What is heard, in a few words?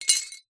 plate dish ceramic broken pieces set down on ground